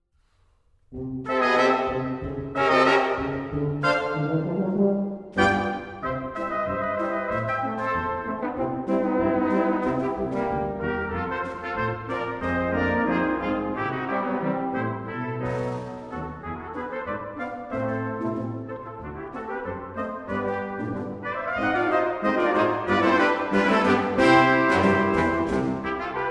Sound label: Brass Rag; Art Collection